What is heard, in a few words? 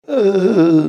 Hurt Dying